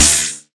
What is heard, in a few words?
shock snap